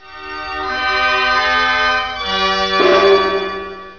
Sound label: ORGAN; Rosegarden